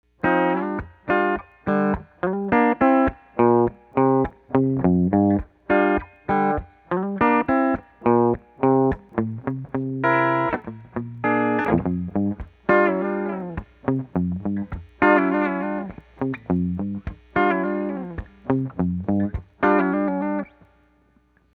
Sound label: 57 Clean Guit